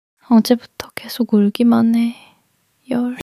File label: crying